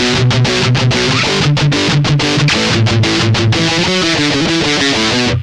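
Strat Mesa bridge, Bridge pickup, Sansamp в режиме Mesa/Boogie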